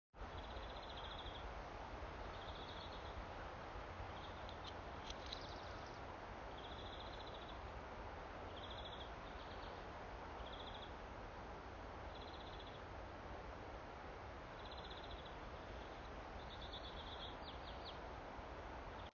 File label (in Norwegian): fuglelyd ute